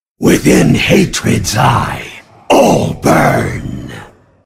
dead, grunt sounds

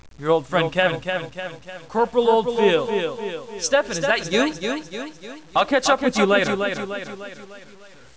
portal voices, ambience

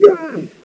pain, player helmet; sven; bts rc

